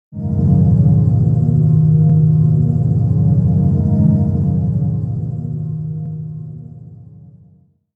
Scifi Audio Logo Ident Submerged Restrained Dark Glow